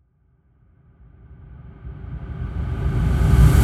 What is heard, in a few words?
Boom Reverse